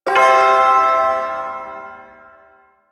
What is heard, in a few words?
Shawzin Pent Maj Chord G; Warframe; Duviri Ersatz Shawzin